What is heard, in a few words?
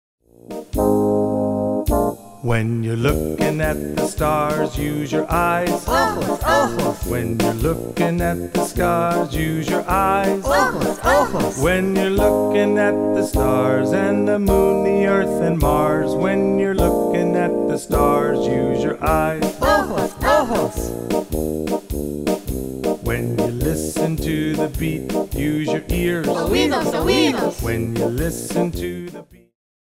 Song for Teaching the Five Senses in Spanish